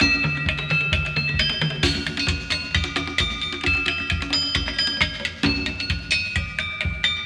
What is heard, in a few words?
Basis 132, Percussion Combos, Abstract World Fusion; M-Audio; Pro Sessions VOL-38